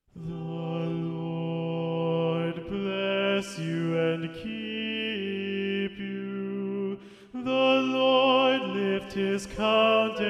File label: Tenor Predominant; choirs